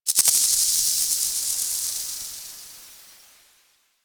Tornado End; Warframe; Tengu

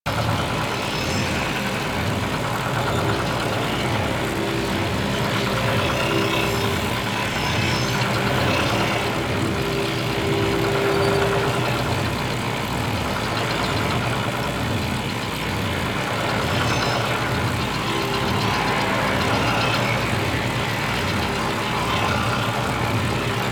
Dormant Mech Loop; Props; Ambience

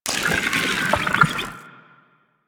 Infested Fishing Fish Caught; Warframe; Infested Fishing Spear

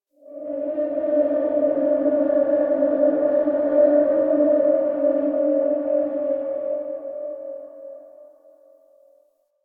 Eerie Drone

